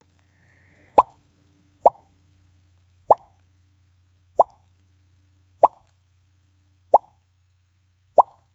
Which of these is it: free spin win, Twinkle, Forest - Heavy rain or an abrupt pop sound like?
an abrupt pop sound like